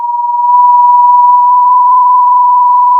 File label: pop, sound of a tea kettle whistling